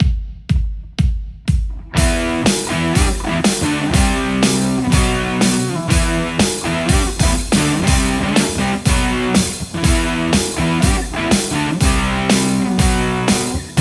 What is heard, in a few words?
lespaul; acme meathead